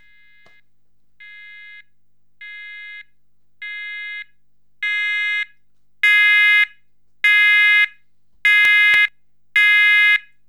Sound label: Sounder